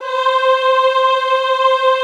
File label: EHH C4, Classical Choir; Best Service